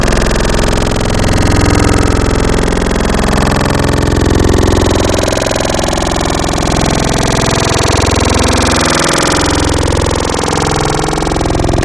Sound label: Zipper, Growl Tables; Cymatics; Growl Tables Vol 2; Serum